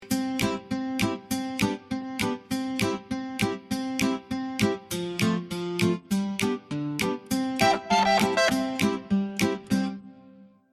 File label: Bajeczka instrumental